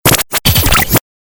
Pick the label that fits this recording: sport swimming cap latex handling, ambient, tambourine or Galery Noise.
Galery Noise